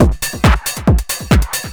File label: percussion loops, Techno; PS-45 WAV Techno ID